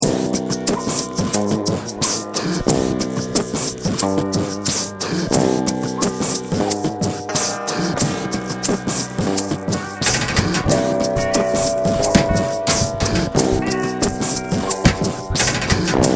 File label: 4 Guitars